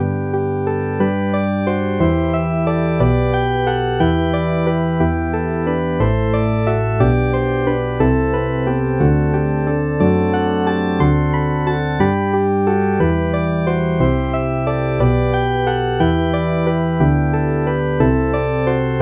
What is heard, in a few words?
synth bass